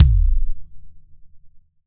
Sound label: Ensoniq VFX-SD Kick